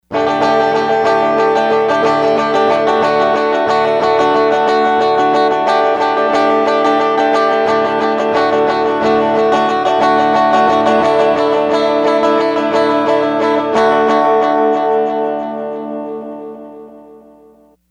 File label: Edge Tone; Sony HR-GP5